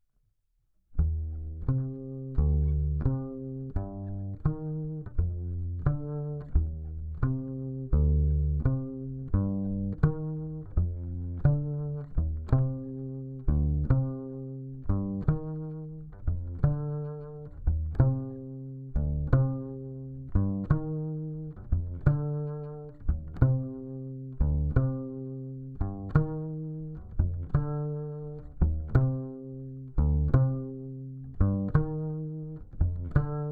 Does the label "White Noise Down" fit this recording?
No